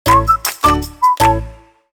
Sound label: soundlogo; westlotto